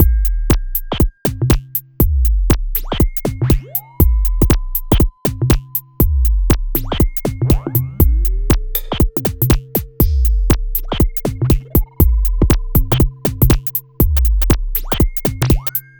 Shuffle Beat

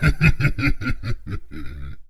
Belly Laugh; Zero-G; Soundclash Nu Dance Electro; Acidized Wavs; FX Atmospheres

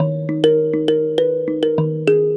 Mbira; Melodic; Ambient-Melodics-II

